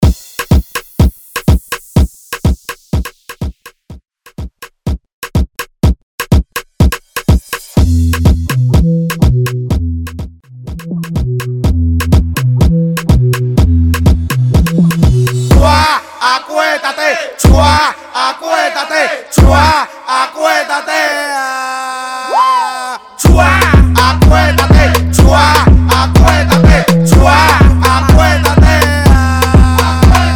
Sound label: Intro Outro Dirty 124 Bpm